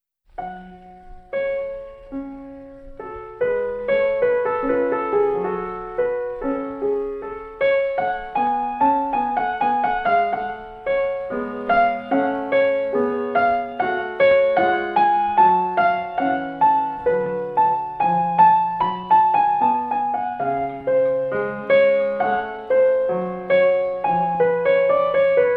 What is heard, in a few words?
Prelude 14 In F Sharp Minor; J S Bach